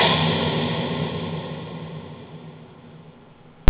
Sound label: crash; akira